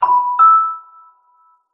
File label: door bell